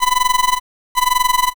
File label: black phone